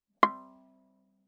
Viola pizz ff sul D C6; MIS Pitches - 2014; Strings

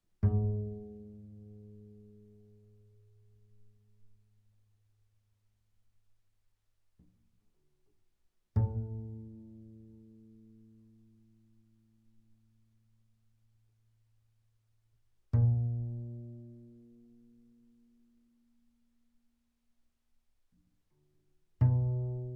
Bass pizz sul G ff G2 B2, double bass

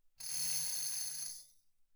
tambourine thumb roll mf; Percussion

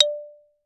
Xylophone rosewood ff D5 stereo; MIS Pitches - 2014; Percussion

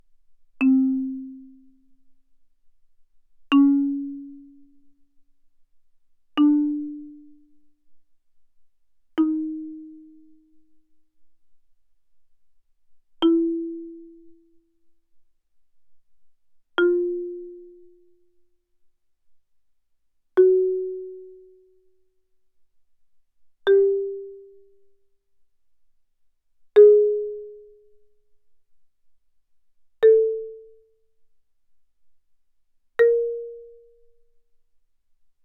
Marimba cord ff C4 B4; Percussion

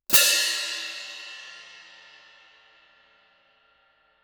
orch crash banda turka ff, Crash Cymbals; Percussion

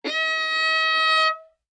Violin arco ff sul D Eb5; MIS Pitches - 2014; Strings

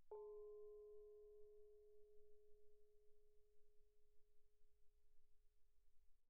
thai gong Ab4 pp; thaigongs

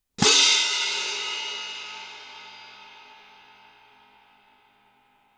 orch crash french ff, Crash Cymbals; Percussion

